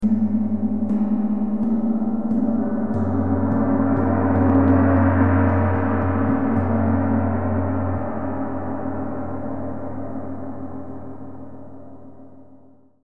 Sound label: tamtam